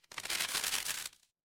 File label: paper fabric crumple